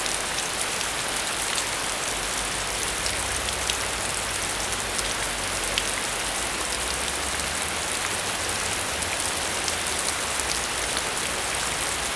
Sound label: Heavy Rain Loop Seemless